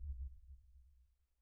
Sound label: sonar Tail Air Close Beacon Directional; Barotrauma; Real Sonar